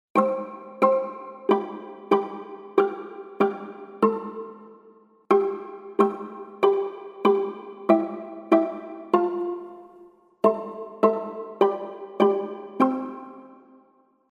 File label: Emotional Viola Pizzicato